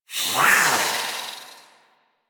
Feral Vocal Attack Medium; Warframe; Catbrow

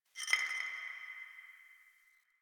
Interior Distant Marble Hit; Warframe; Ambience; Nineteen Ninety Nine; DE

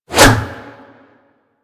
Staff Swing C; Warframe

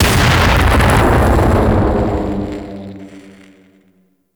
Orbital Strike Drone Explosion; Vay Hek Orbital Strike Drone

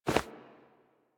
Movement Foley; Warframe; Wisp

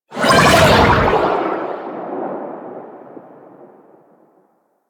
Insect Liset Fly By Quick, Vehicles Flight

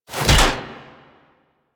Nose Finisher Punch; Warframe; Venus; Orb Heist

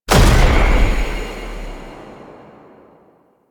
Unhallowed Impact; Warframe; Warframes; Dagath

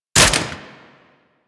Sniper Rifle Mech; Gorg

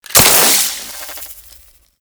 Branding Bolt Destruction; Warframe; Tutorial

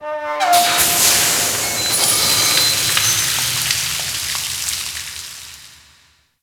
Steam Blast Small, Ocean; Warframe; Grineer Ocean

